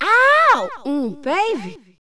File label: baby, female ambient